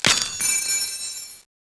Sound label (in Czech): Krup A cink